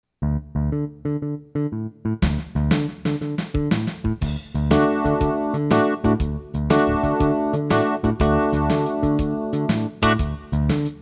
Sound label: bag blues acc